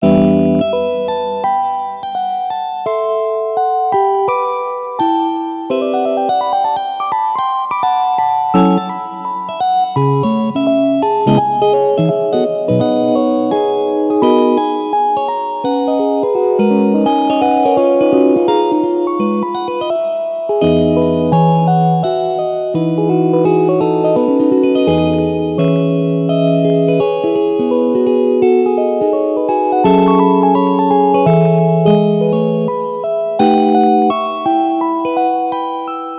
Christmas Special 4 (Guitar)

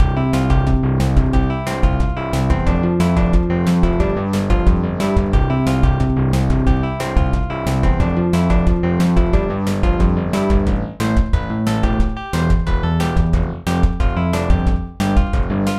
happy plains loop

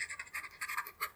pen scribble, writing scribbles

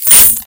coins

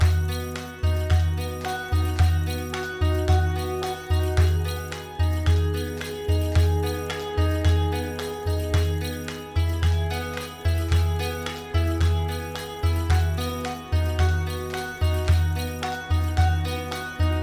Happy Clappy Loop

